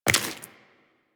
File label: Step Flesh, Footsteps Walk; DE; Warframe; Tenno